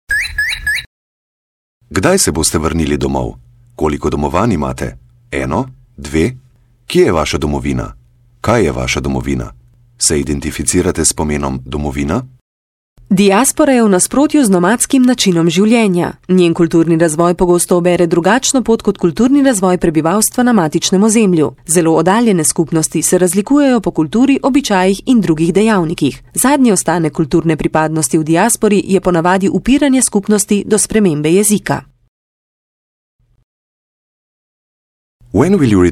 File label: jingles